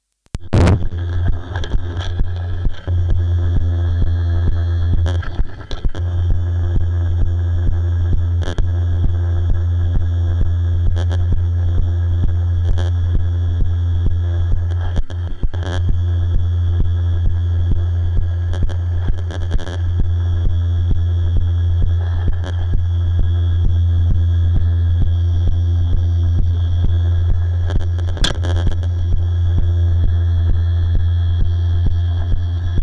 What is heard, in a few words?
NOISE BROADCAST